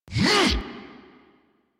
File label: Melee Attack; New War; Erra